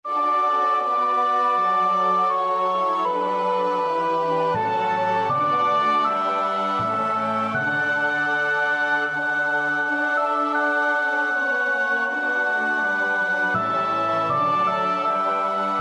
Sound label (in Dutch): sopraan; Bach (Johann Sebastiaan); BWV 029; 7 Sei Lob und Preis mit Ehren